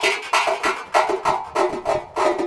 Echo Chuff Down Up, Synthetic Pulses

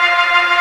Organ, MortStrg+WurlVox

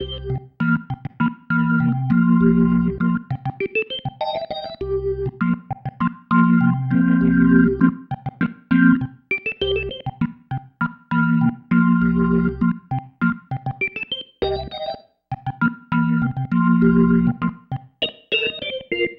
Pattern, Organ

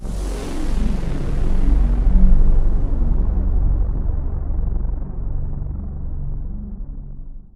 Drop FX F#1; Zero-G Club Techno

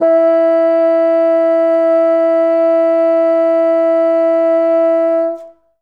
Bassoon E3, Classic Orche; Best Service ProSamples vol.51 - Classic Orchestra 2

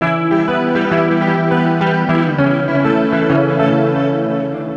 Guitar Loop; Ambient Grooves

